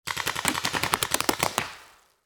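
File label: Paintball Gun Impact; Sound Ideas; Guns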